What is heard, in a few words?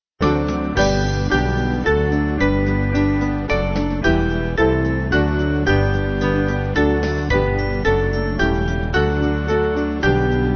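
Band, Music Backing; Ye Servants Of God; Hanover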